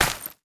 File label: land stone